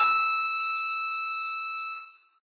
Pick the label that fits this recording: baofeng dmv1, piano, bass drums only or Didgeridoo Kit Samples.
piano